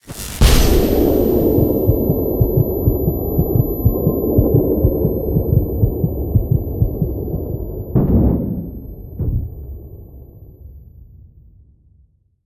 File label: explosion grenade concussion